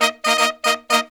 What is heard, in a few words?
Pop & Funk Brass